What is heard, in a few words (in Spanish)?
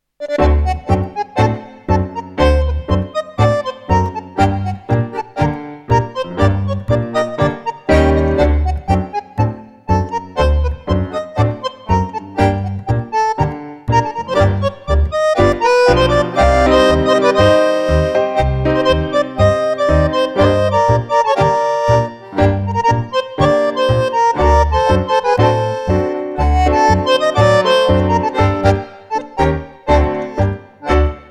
tango